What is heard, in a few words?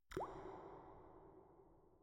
Water Drops Single; Inquisitor 3